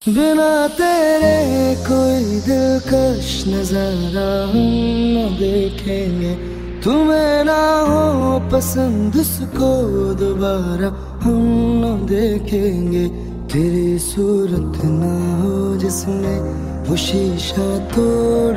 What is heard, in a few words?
Old Hindi Song Ringtone